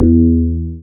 BAS FM DX-7

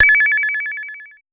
Pollard Syndrum

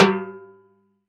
Timble Hi, Roland SPD-S

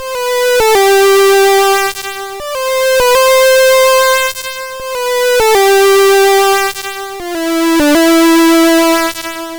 C64 Synth Phrase

